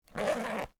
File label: bag zipper, cloth